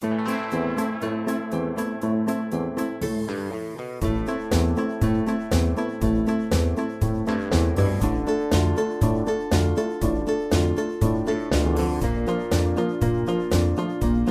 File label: Zombie Musical